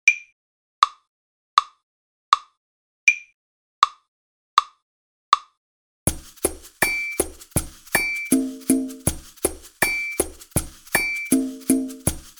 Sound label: Groove Latin